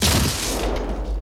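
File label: laser gun; Total Control - Action Cutz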